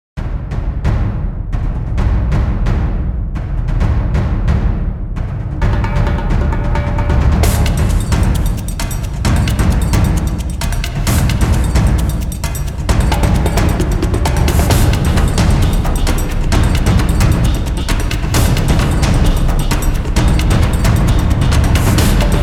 combat opener; Venetica Gold Edition

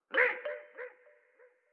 Mech Aggro; StyxMech

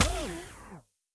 Break Rubber

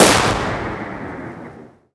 Troll Lobber Shoot Fire

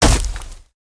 Dash Impact; Caffiend

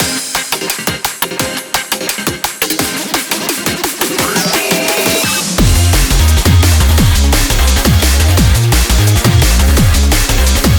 Bgm Scramble; Mahjong Fight Girl (Arcade) (gamerip) (2023)

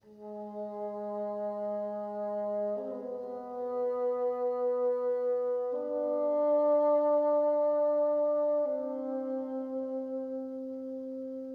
84varbpm 4-4meter; Planet of Lana

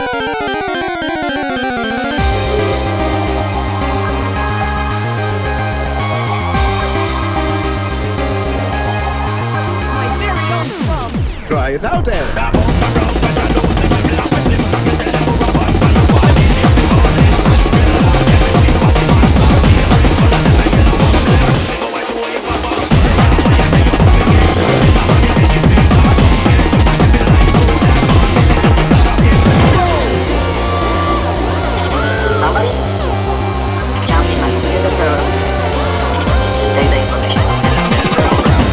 Venetian Battle; Sea of Secrets ~ Beneath the .WAVs

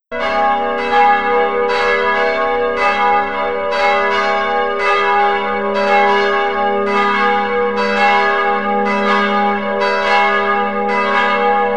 Vaticancity Vaticanbells

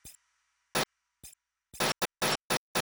Music Unlock Loop

